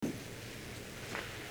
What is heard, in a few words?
offertory